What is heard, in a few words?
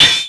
ROCK